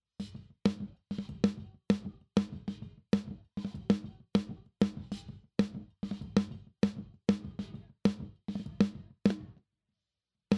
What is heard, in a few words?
Snare On; Lewitt Mix Contest